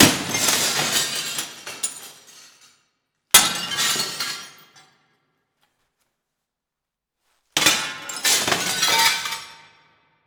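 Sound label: Glass Impact Debris; The Sound Catcher; The Abandoned School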